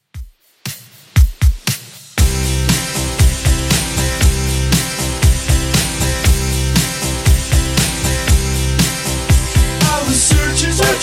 70s Redrum 118bpm

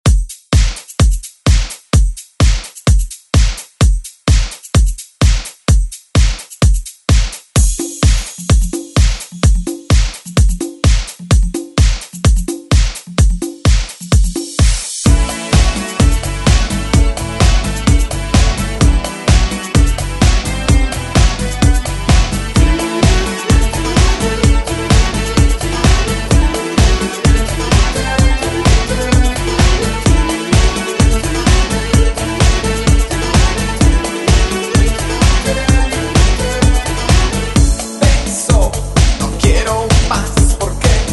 90s ReDrum

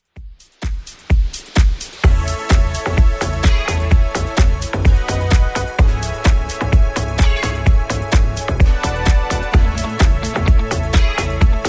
Top40 Club Redrum 128bpm

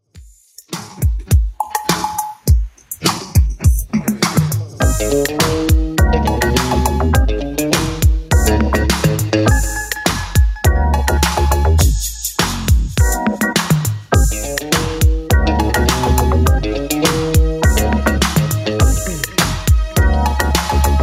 80s Extend Redrum 103bpm

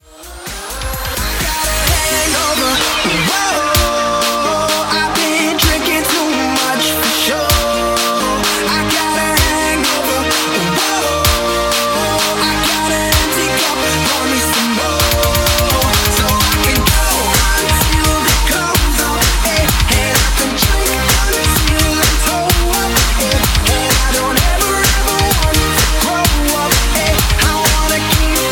128bpm Cm

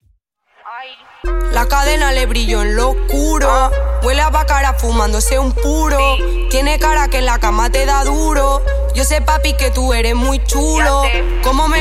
Remix Intro Outro 97Bpm

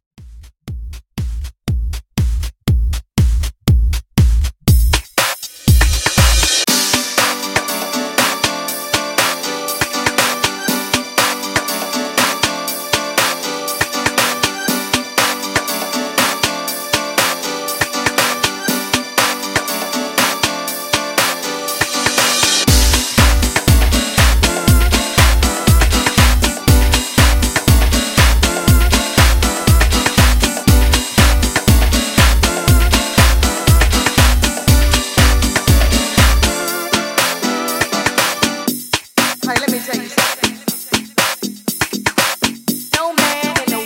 Dance House 120Bpm

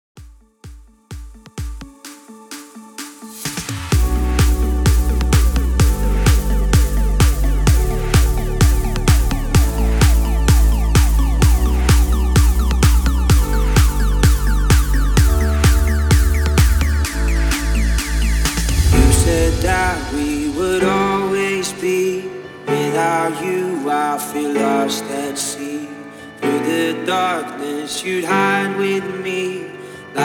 PROGRESSIVE HOUSE, 128 BPM

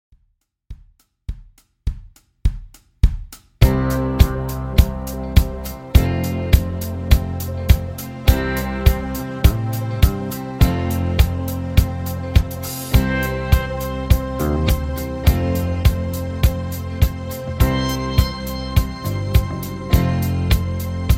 103 Bpm; Dj Intro Outro Clean

